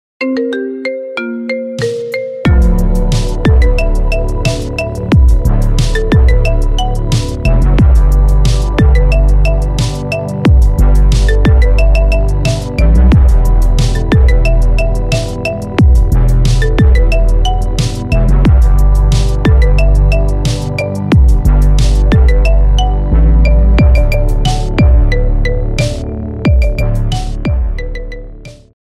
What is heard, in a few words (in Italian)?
Sunflower Marimba, suoneria